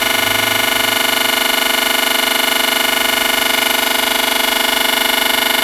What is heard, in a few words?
wm8731 error